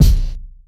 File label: short reverb bass drum sample b key